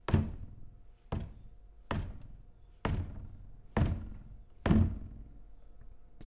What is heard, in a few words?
Kicking Plastic Drum With Water